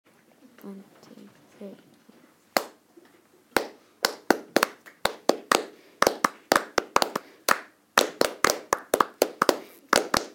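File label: clapping piece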